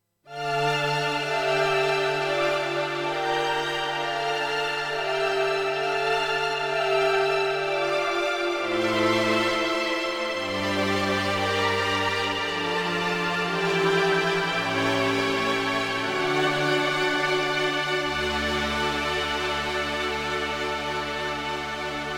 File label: pad yammer